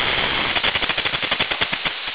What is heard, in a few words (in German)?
klapper; Klapperschlange